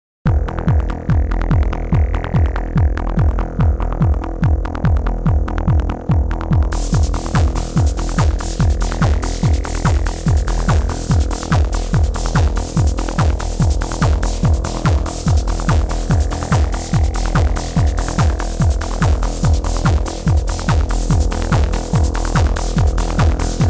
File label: didge